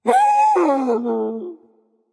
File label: fear; cuchulainn